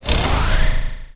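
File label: attack chainsaw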